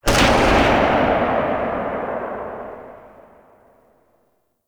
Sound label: Quad Sniper Tail; Warframe; Weapons; Tenno